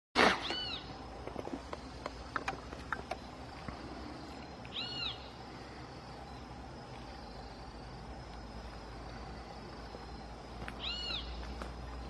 canto do Tauato miudo

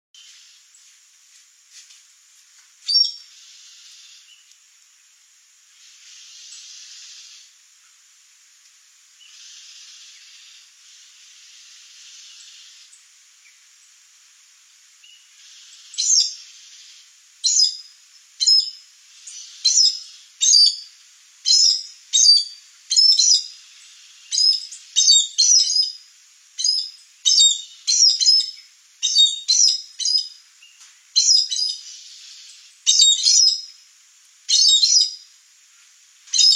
Canto do aracari de cinta dupla

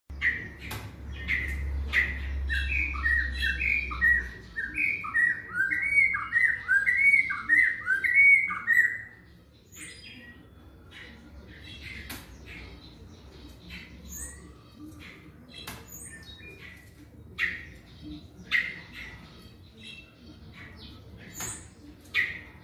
canto do corrupiao de cauda amarela

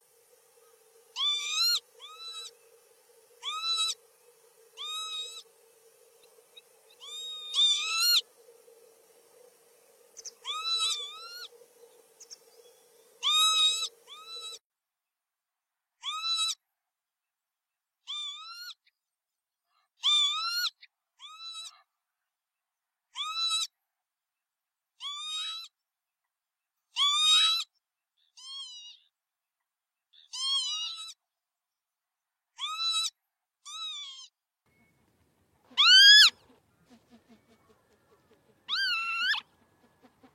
canto do abibe de cabeca preta

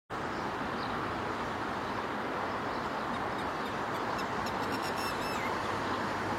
canto do perdiz do mar oriental

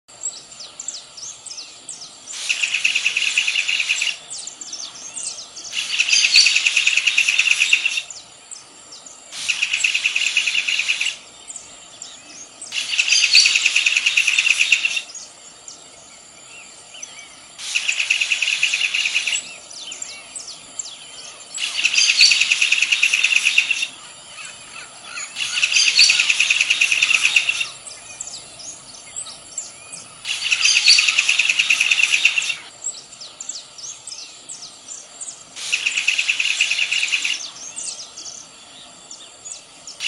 Canto do martim pescador miudo

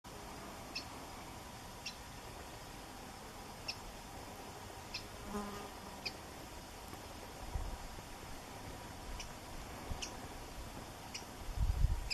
Canto do tesoura cinzenta

